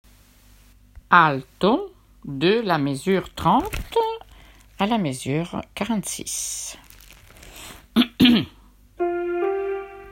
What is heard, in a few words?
alto lullaby